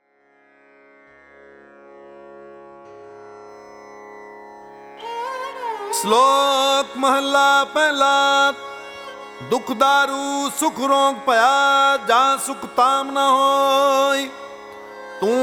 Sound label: chhant; Punjabi; Granth Sahib; Guru Nanak Dev ji, Guru Ram Dass ji and Guru Arjun Dev ji have recited their Bani in this style